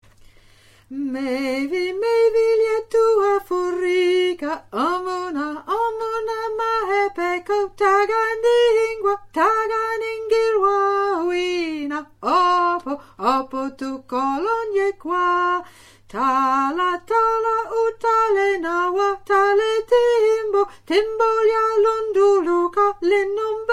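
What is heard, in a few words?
Soprano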